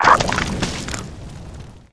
crag toad dead, monster

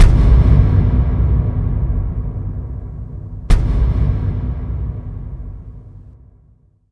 beetle raser

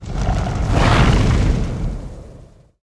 flame hound attack, monster

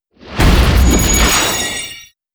ice lord, monster

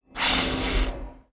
guardian scorpion, monster

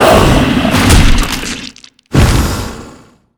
boss gorge deth

